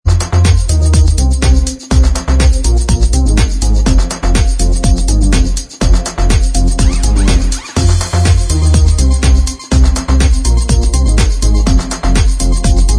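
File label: Instrumental Track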